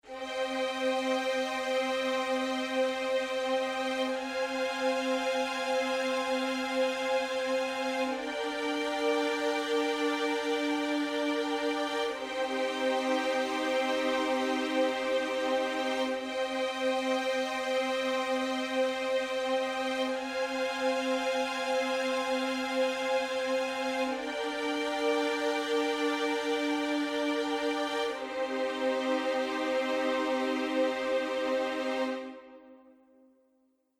Transition Sad Music Ending

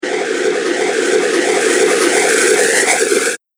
Amazing Air Sound, whoosh; Andrew Fleming

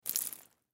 Liquid Spill From Plastic Cup Short; Blastwave

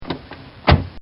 Opening And Closing Door

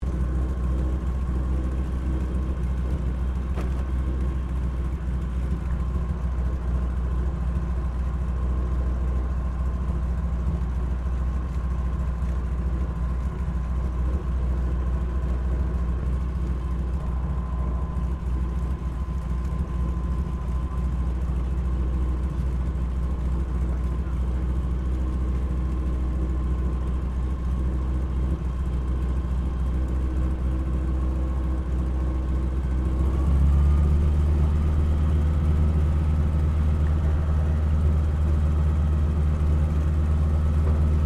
AIRCRAFT AT 6D HARVARD MK III TAXI INTERIOR; Harvard Training Aricraft; Airplanes; Vehicles

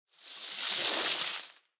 Skiing Snowboarding Coming To A Stop Distant; Skiing And Snowboarding

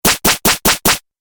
Laser Zap, Laser Gun

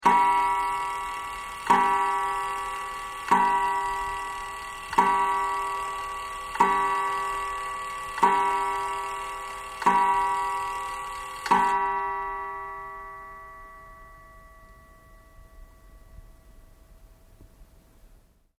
Clock Chime, Chimes 8 o'clock- old clock; Clocks; Machines; Tom Hutchings

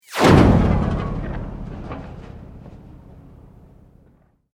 Sweep Mechanical Medium

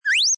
Cartoon Sweep, Slip, slide or swish away.; Comedy/Cartoon; Funny Sounds

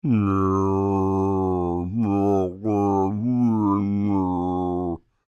Creature, Human, Speak, Groan, Long; Groaning; Big Room Sound

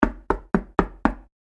Foley Door Knock Solid Door

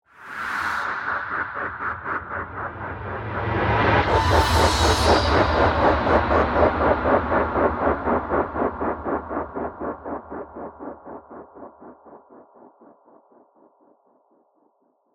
Chopper Eerie, Horror, Chopper, Eerie; Scary Soundscape Sound Effects; Blast Wave

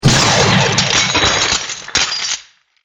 Laser Destroys Wall; Laser Gun Sound Effects

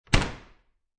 Lid Plastic Garbage Lid Close

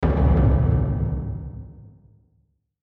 Hit Drum Low; Hit Drum Low Delay; Blastwave

